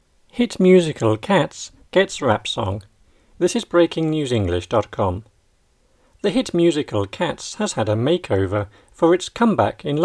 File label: rap song